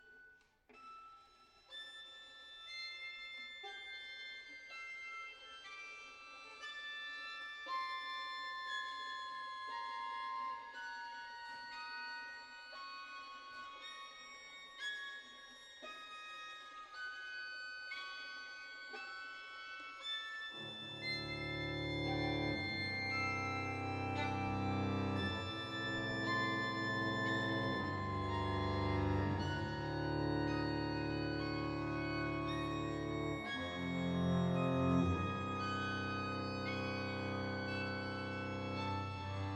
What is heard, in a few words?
String Quartet